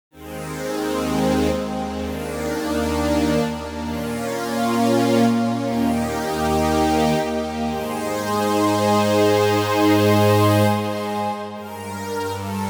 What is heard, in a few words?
pad zeta centauri